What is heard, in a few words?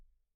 Kick In